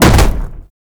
Transition State Foley; Warframe; Tau; Roathe

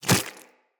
Inf Small Walk Default, Footsteps; Infested

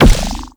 Corruption Pickup Large Spawn; Warframe; Zariman; Missions

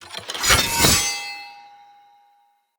Dagger Finisher Accent Ground; Weapons; Dendrit

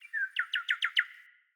Cardinal Male Bird Song

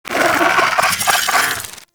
Vocal Idle; Warframe; Moa Satyr

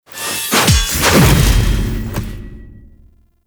riposte nikana finisher foley; Enemies; Drifter; Warframe; Duviri; Dax

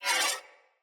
Sword Whoosh Accent; Fey Sword

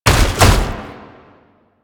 Gallop, Foley; Enemies; Warframe; Proto Infested; Babau

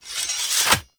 Battle Katana Sheathe; Warframe; Tau; BoroOverlook

